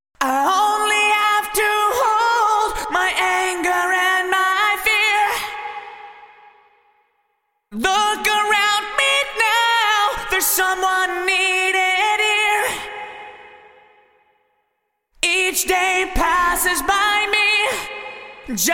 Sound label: Vocals Bricasti Halls 2 Large Hall 2.6s PD30ms Vintage Digital